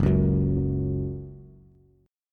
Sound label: F sharp 5th F sharp Bass